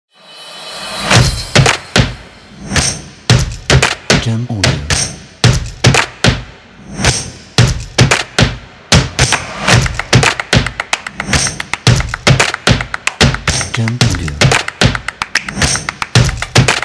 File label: Motivational Percussive